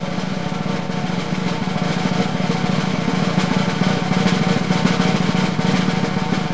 SNR ROL, Synclavier Percussion 2 - World & Orchestral; ILIO